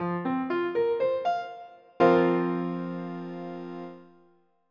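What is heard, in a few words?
F sus4, guitar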